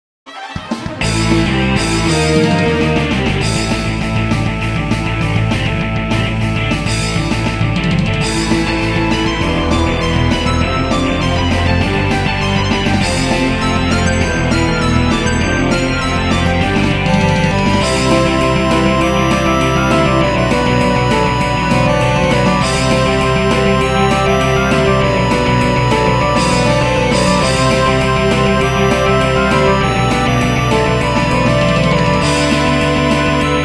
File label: battle music